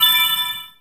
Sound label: GAME CHEER BREAK SLIDE; maimai